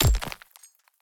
pick axe

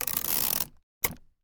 cellophane tape cut

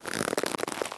bow draw

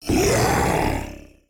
goblin cry, battle

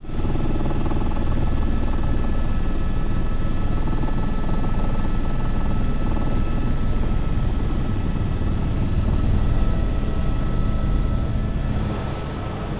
helicopter out

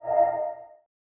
decision, button

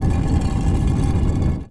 stone wall slide

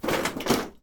iron plate

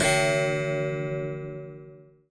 wall clock

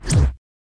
kick real swing

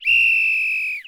police whistle

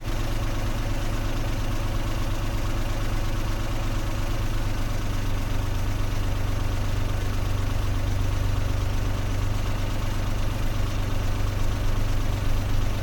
car idling

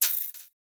cancel, button

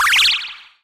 jump cute

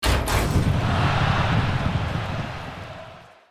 keiba start

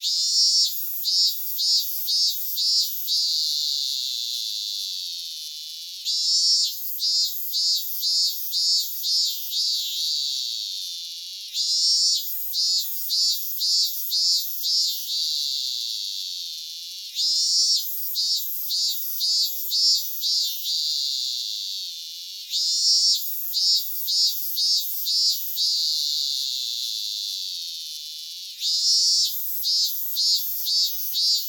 minminzemi cry

